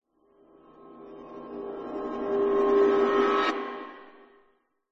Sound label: horror riser metal reversed rattle